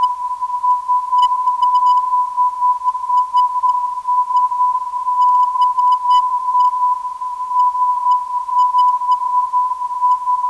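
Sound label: non-directional beacon; SBI; 332kHz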